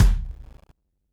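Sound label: dance BGM drum; gamerip; PS3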